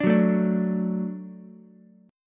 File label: F sharp Minor Sharp 5th, Ukulele; strummed